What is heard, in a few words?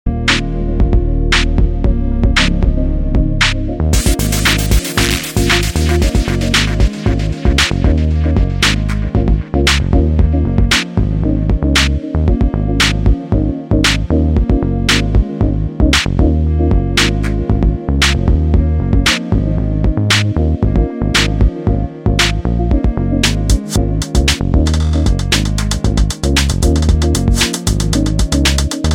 fuzz jazz